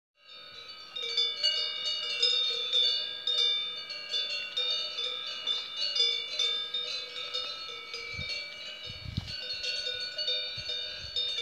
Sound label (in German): Kuhglocken in Italien Sommer